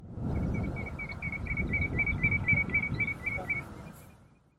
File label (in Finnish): liro; linnut